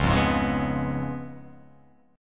Db 7th Db down; Db7 strummed; JGuitar

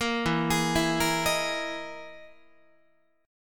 E Major 7th Flat 5th down Guitar; EM7b5 arpeggiated

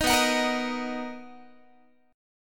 B Major 7th B down Guitar; strummed

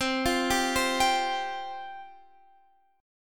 F Suspended 2nd, chord

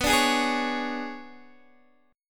B Minor 6th Add 9th B, Guitar DADGAD

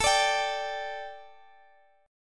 A 7th Suspended 4th A, Guitar DADGAD; chord

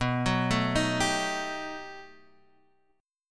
B 7th Flat 13th B, guitar chord

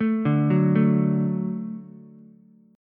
D-Major, D, Ukulele; arpeggiated; 2,2,2,0; JGuitar

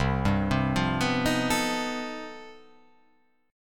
C Minor Major 13th, chord

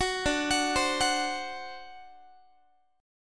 D 7th, down, Guitar; arpeggiated; 21,22,21,22,22,x

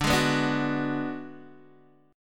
D 6th Flat 5th D down Guitar DADGAD, chord

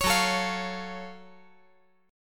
Gb Minor Major 7th Gb down

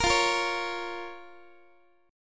F Suspended 4th Sharp 5th F, Guitar; Fsus4#5; strummed; x,x,13,15,14,12

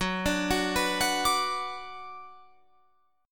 Gb Suspended 4th Sharp 5th, Guitar, Open F; Gbsus4#5 arpeggiated; 18,21,18,18,21,21

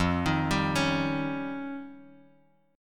F 7th Suspended 2nd Sharp 5th, Guitar, Drop D

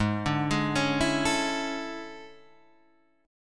E 13th G sharp

